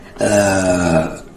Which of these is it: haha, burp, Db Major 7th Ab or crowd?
burp